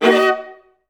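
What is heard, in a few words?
violas D; Best Service; Peter Siedlaczek's Advanced Orchestra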